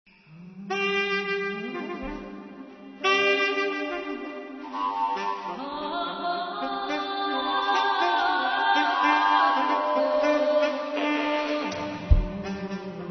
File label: fujara